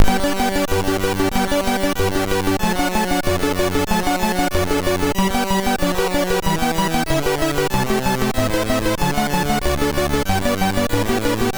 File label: G Dur, sid MOS6581R3; Concerto G-Dur